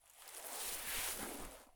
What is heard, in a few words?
prone grass low; Escape from Tarkov